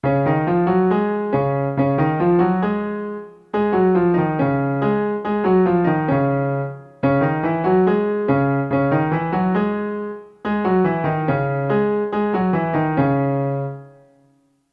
16th note in D flat and C sharp m

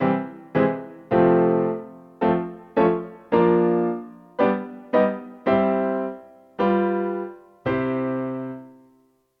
augment

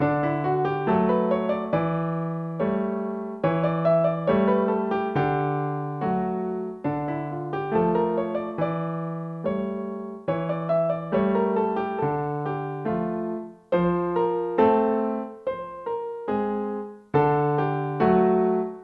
practice parallel bars

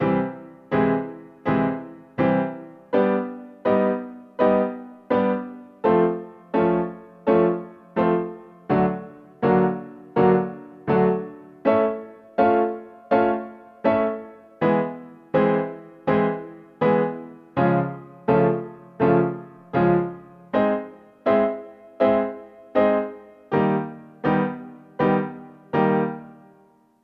augment group 123

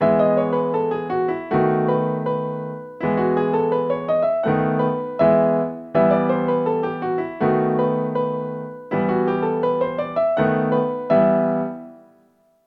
16th note in E and Em

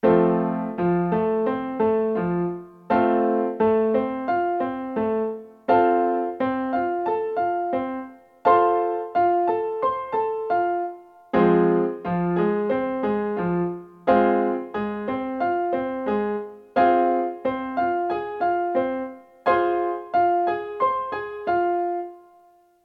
triad and inversion next rhythm 1 in F and Fm

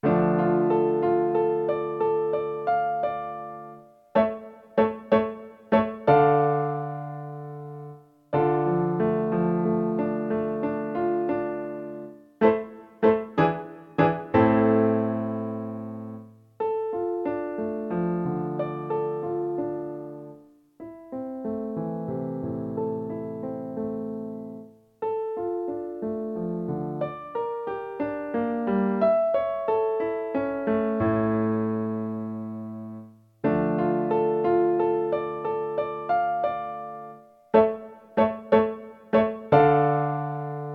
practice space ride

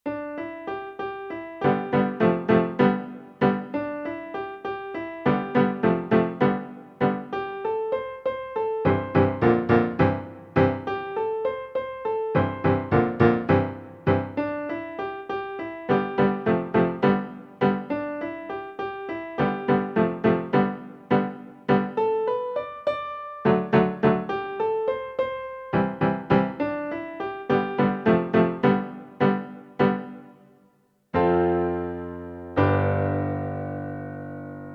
2nd inversion rock

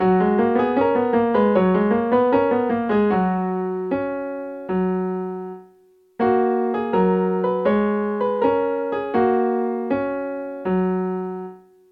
dotted 8th note in G flat

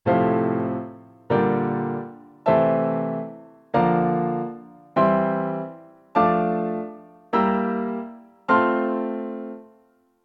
piano 1st inversions in F Major

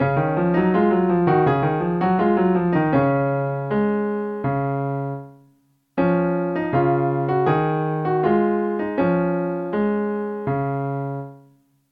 dotted 8th note in D flat

